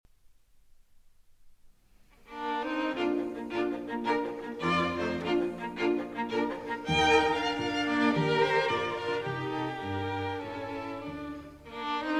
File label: String Quartet in B Flat Major; Brahms